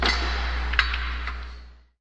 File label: forklift, Factory Percussion; UNUSUAL KITS and FX